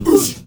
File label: MALE WAR, Heart of Africa, shout